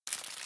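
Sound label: knistern crackle rascheln rustle geräusche noise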